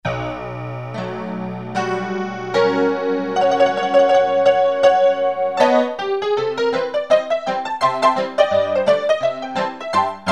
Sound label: Piano